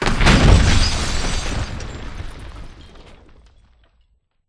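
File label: explosion mid distance debris; corporate; Titanfall 2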